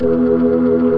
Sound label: B3 E 4, organ; AKAI S-Series CD-ROM Sound Library VOL-1